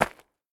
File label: dirt, walking; factorio; Factorio 0.14.21